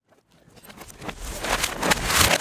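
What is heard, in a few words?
Bridge-Collapse Collapse Reverse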